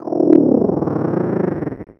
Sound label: purr